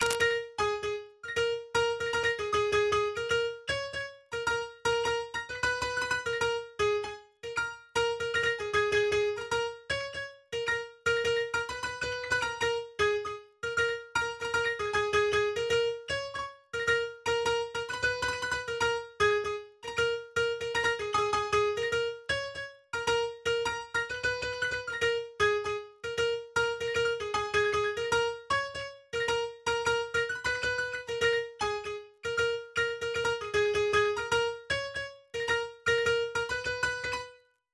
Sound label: Drop Synth WET; Drop Synth 3 WET SC